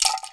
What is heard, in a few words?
RATTLE, INDIAN PERC